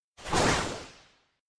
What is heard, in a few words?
appear